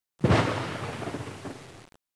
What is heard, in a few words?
thunder